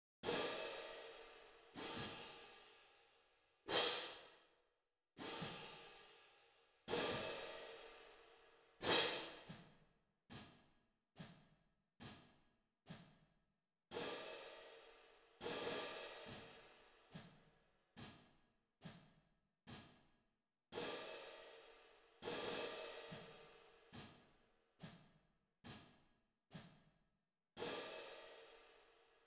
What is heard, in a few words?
cymbals, drumline